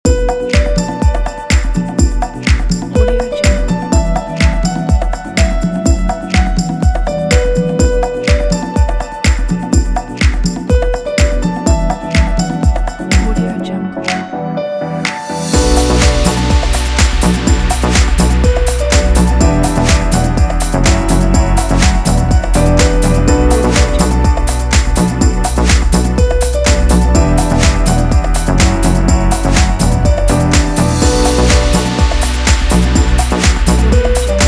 inspiring funky corporate; chjoy48

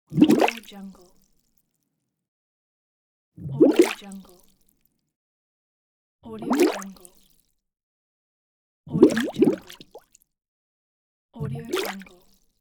short bubble splashy liquid movement

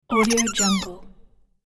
urban gremlin

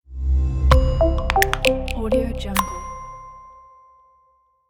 short intro logo

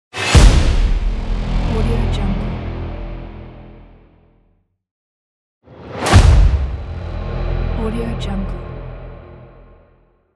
scifi hit; phillipmariani

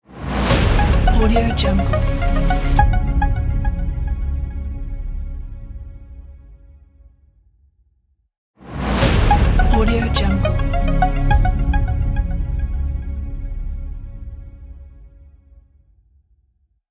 logo news

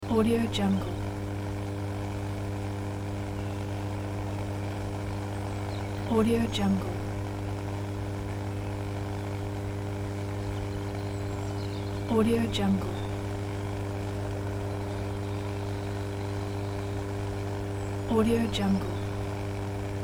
electric substation park

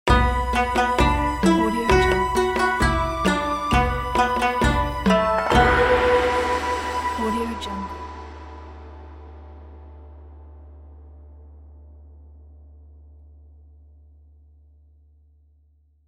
asian logo; applehillstudios

